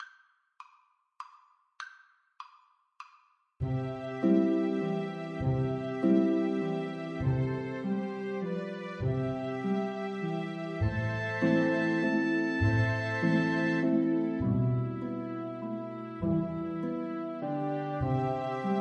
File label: waltz, flute